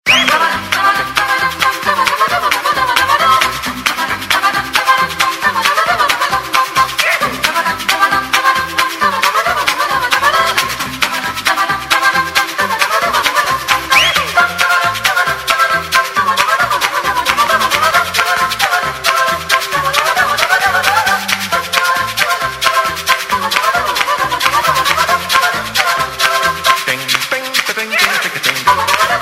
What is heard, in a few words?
Polka with Mr. Bass